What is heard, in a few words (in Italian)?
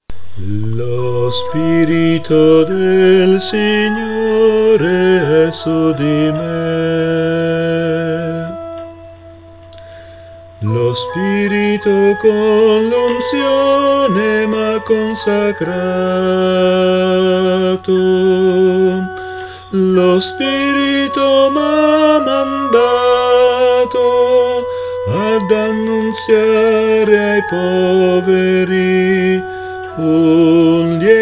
soprani